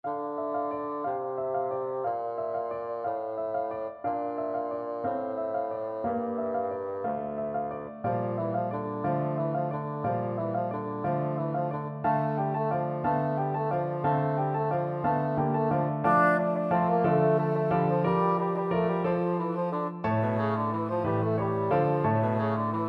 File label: bassoon